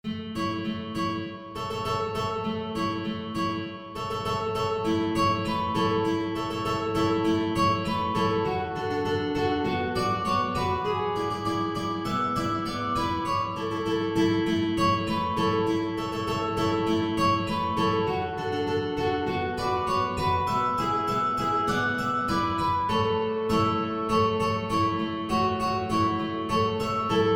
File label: Jingle Bells D6, ukulele